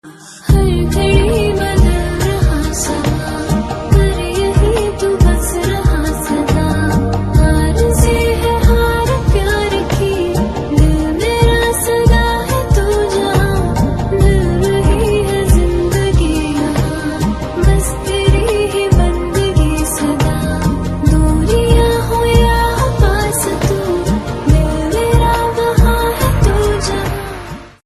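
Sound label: original soundtrack ringtone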